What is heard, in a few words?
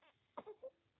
crying, baby cry